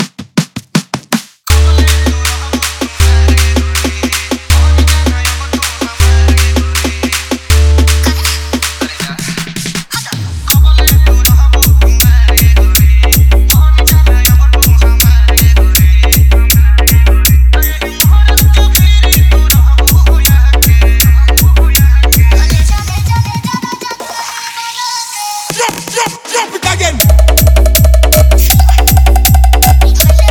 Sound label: Dj Hard Vibration Bass Mix